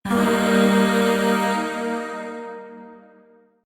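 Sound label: Void Shawzin Pentatonic Minor Chord; Warframe; Zariman; Chords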